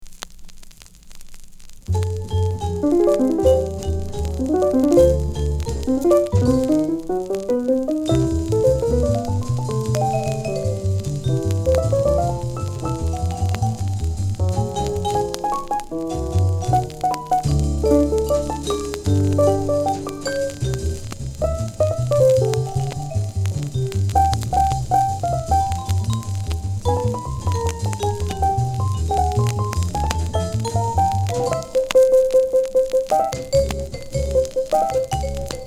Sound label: Piano